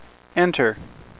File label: ENTER